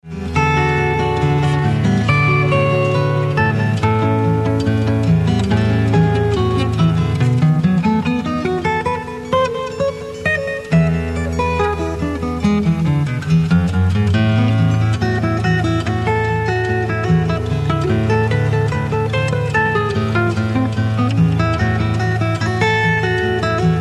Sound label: wind sailors melody